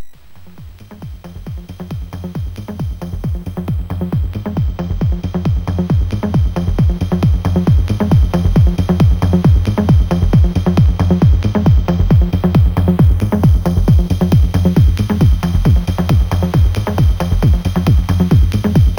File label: Vicious SID 2-15638Hz, MOS6581